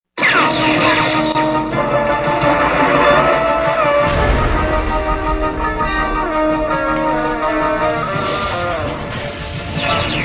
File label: Theme Instrumental